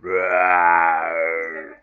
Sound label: zombie groan normal; plague